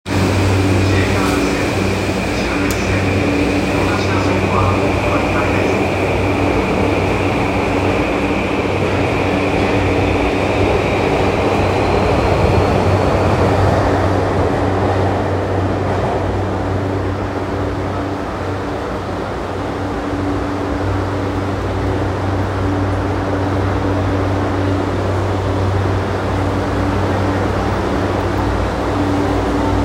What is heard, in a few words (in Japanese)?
東急田園都市線渋谷西